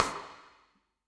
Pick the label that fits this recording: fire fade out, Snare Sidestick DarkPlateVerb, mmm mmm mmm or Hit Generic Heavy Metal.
Snare Sidestick DarkPlateVerb